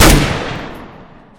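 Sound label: Weapons P90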